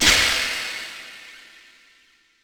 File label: Crash, impact samples